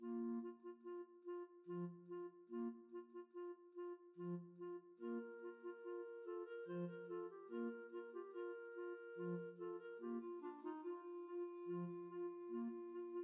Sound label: clarinet trio; ravel